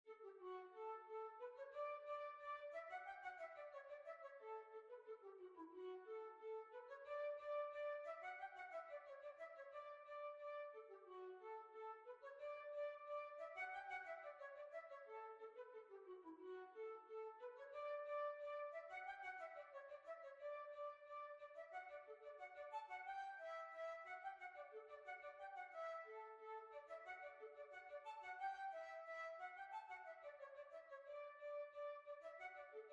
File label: Hornpipe; Miss Richards Hornpipe